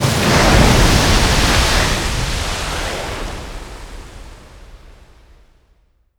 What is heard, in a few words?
wave crash; Modern Warfare